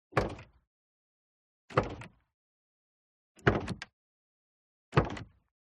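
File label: SPORTS CAR Exterior Door Open Soft; TRANSPORTATION; smartsound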